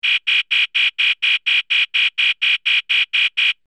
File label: klaxon, Alarm Klaxon; Fast , Chirping Electronic Alarm; Alarms; Electronics